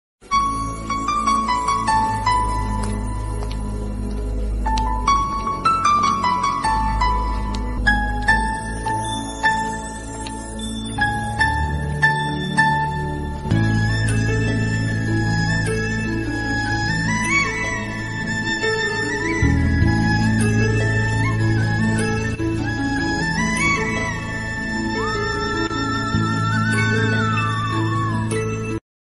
tamil ringtone bgm love ringtone tamil romantic; vijay; shajahan

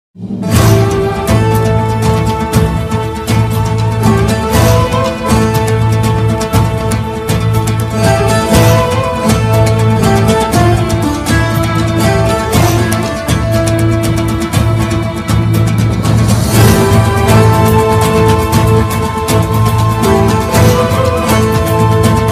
attitude ringtone, Islamic ringtone; osman bey; kurulus osman; osman ghazi

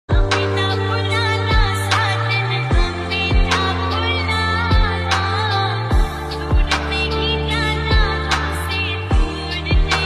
song ringtone

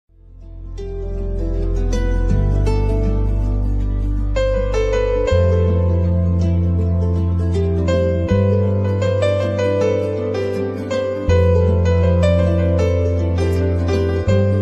song instrumental ringtone

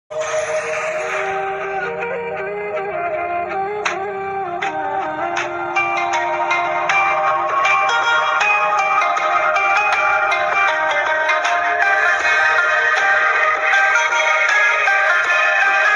islamic special ringtone instrumental ringtone; maula ya salli wasallim; qaseeda burda

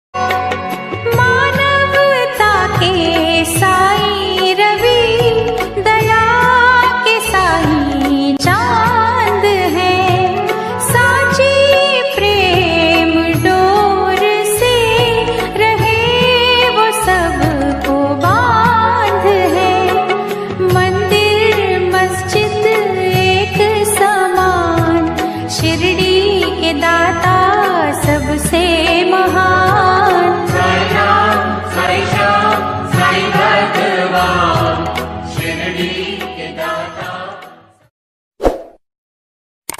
bhakti ringtone; sai baba ringtone

